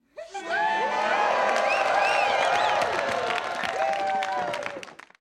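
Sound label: Crowd 100 Interior Cheer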